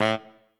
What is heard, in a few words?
Trumpet melo